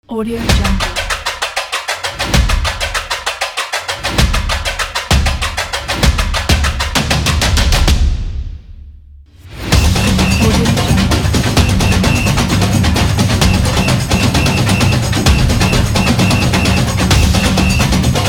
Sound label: carnival